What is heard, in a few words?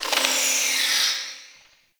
Mid Bird; Ambience